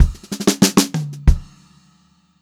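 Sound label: STRAIGHT VARIATION, Funk-Groove Drumloops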